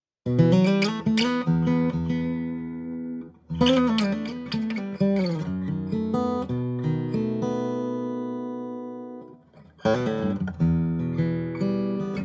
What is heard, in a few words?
Guild F 55 Maple; Antique Burst